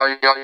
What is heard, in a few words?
VFX, VOX ROBOT; Best Service ProSamples vol.49 - FX-Area; AKAI